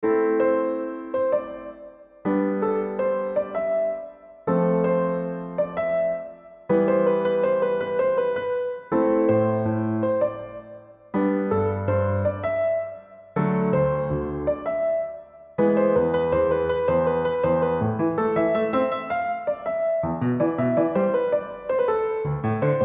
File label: solo, piano